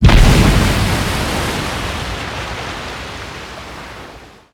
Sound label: damage hits explosions, Warships